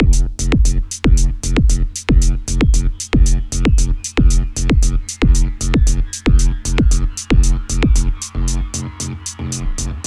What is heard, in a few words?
Square Bass